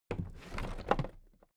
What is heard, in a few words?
toolbox raise heavy